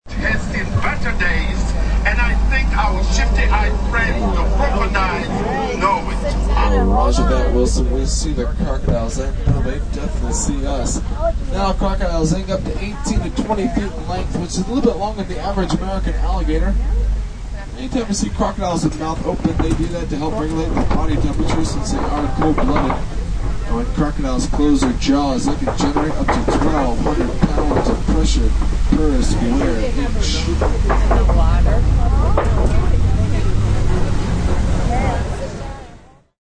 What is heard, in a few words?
African safari ride at amusement park.; Rides; Sound Effects:Games